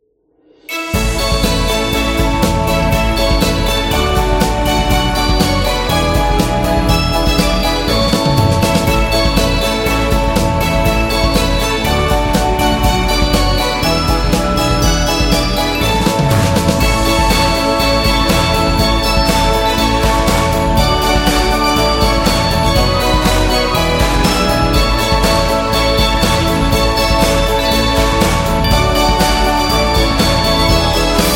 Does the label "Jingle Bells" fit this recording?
Yes